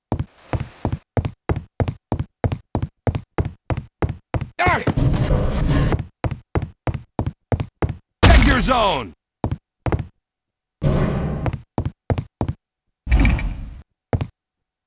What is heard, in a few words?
realtek hda only eax; hl1; hl-based eax